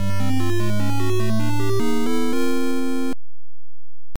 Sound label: When Ganon Appears and Is Defeated Fanfare; Zelda no Densetsu